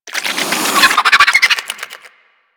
Infested Biped Warning, Enemies Infested Moa Vocals; Warframe